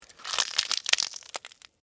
Tear Crunch Cabbage, Texture, Tear, Crunch, Cabbage, Leaf, Vegetable; Ripping Sound Effects